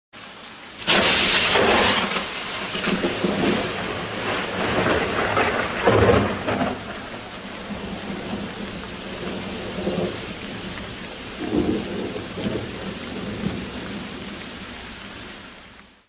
thunder

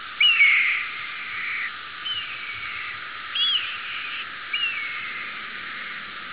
flying bird; Jungle

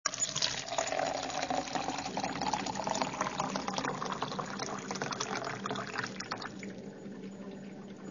pour water; angels&dogs

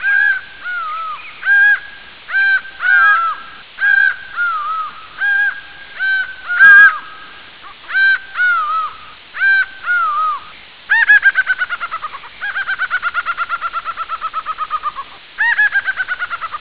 jungle birds; WorldsZoo

